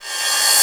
Rev 909 R, dry perc; Quasimidi Rave-o-lution 309

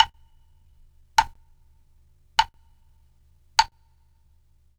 blk; Percussion

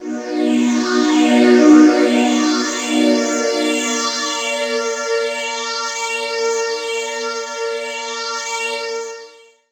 Pad Wash; Jungle; Pads; Zero-G Jungle Warfare 1, 2 & 3; 90 s Sample CDs

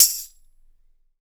TAMB PLASTIC; Big Fish Audio; Ross Garfield Drums VOL-2; GM PERCUSSN

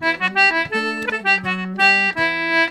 C4 L, Celtic Flavours; USB Soundscan vol.42; AKAI

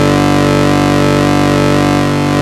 ARP 2600 G2, synthesizer; Hollywood Edge - Giorgio Moroder Rare Synthesizer Collection

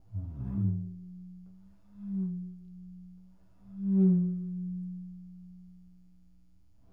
Box Drum; Percussion FX

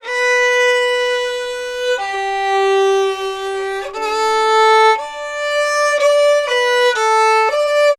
Fiddle, Appalachian

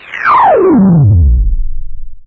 Drop FX C3, Club Techno; Zero-G Club Techno

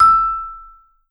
Vibes; Sampleheads - Dave Samuels Marimba & Vibes

